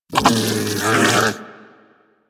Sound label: Armadillo Vocal Snarl; Characters; Warframe; Hunting Critters